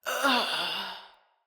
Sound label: Death Female; Battle; Envoy